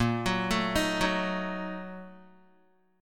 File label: Bb Major 7th Suspended 4th Sharp 5th, Guitar; 4E,4A,5D,5G,5C,6F; arpeggiated